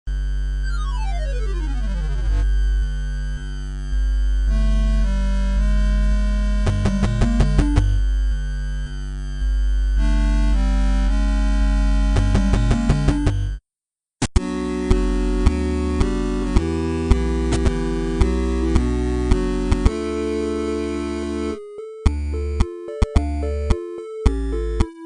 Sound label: Impact; juno s darkest hour music